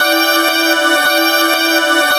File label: RINSING, AMB. WASHES; Spectrasonic Distorted Reality 1